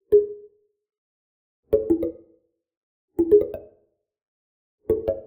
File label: Digital Click Positive